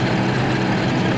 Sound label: fordT; Mafie 1